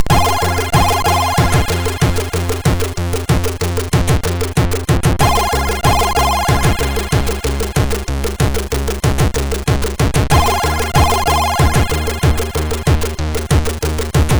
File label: Intro, MOS6581R2; Intro 2012 V3